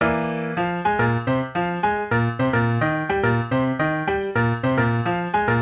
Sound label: PIANO